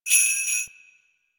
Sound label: Sleigh Bells Single